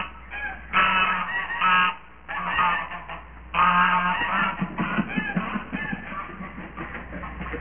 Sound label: geese